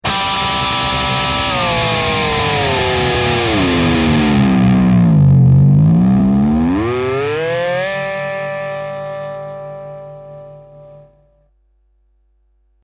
Divebomb

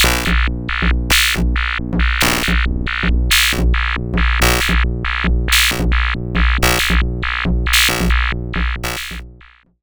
Leploop V3 Loop

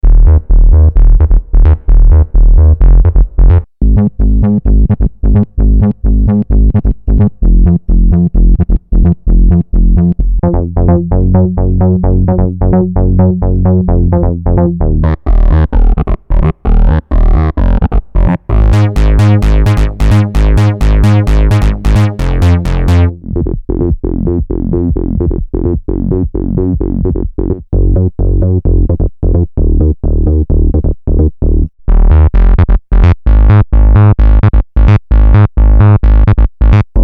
bass

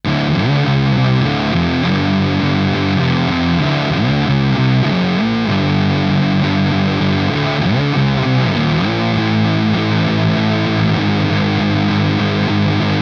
Beetronics Vezzpa Fuzzz

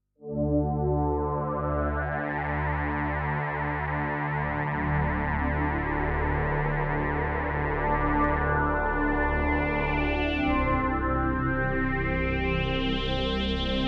sweep

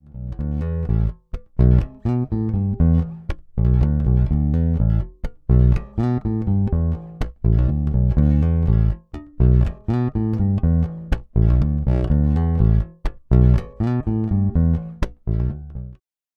Precision Bass Neck Pickup aktiv; Fender Troy Sanders

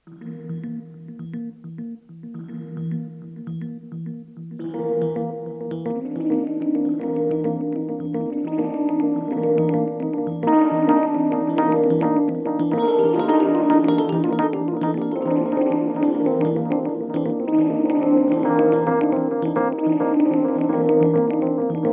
Echo Fix EF X2 treble max Mode 2

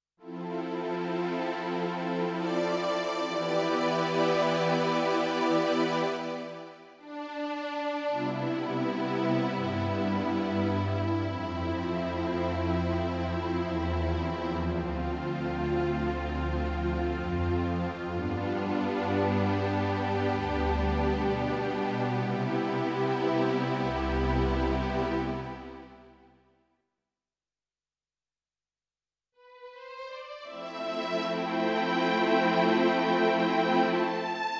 Strings

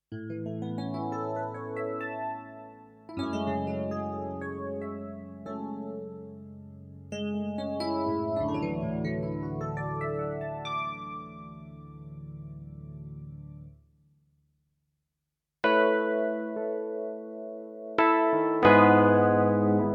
SP5100 E Piano

